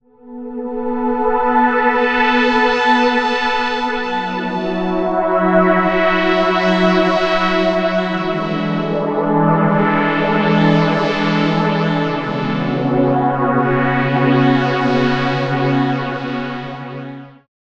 VSM IV Eminent 310; GForce

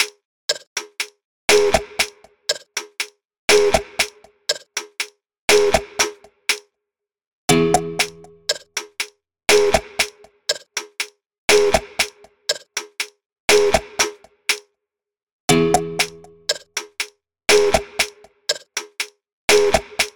XLN Audio Life Berimbau

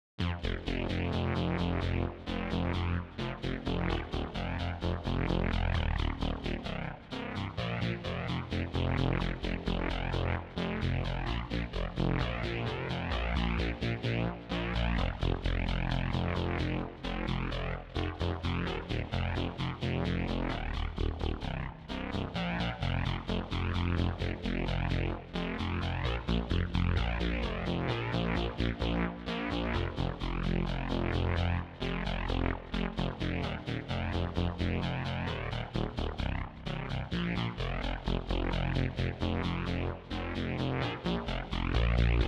Audiokit Nerdsynth Agressive Bass

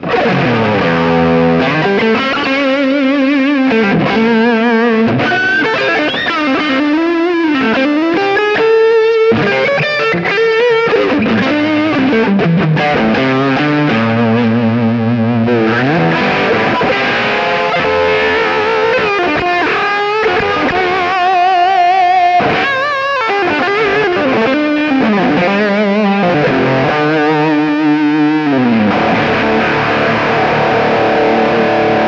Overdrive Gain Treble Bass; Spruce Goose; Lift 2; Treble 4 Uhr; Bass 12 Uhr

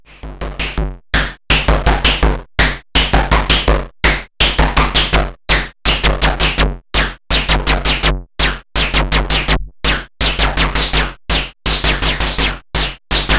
Groove; Body Synths Metal Fetishist

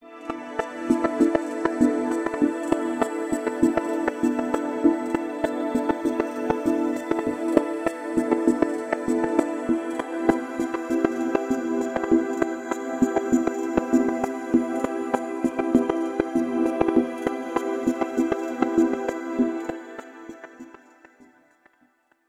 Pad

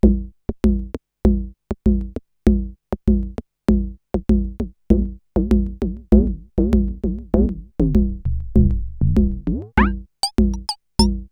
Behringer Syncussion SY-1 groove

